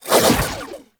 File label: Archon Whip Spin Whoosh; Warframe; The New War; Nira